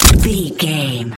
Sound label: gun silenced sniper shot